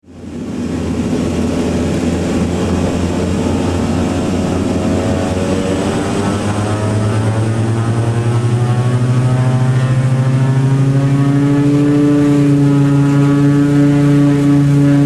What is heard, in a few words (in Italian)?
dc3 decollo